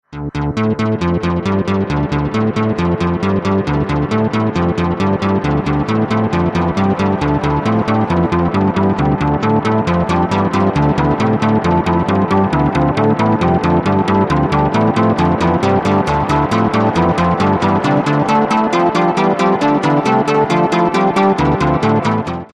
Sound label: synthesizer expirement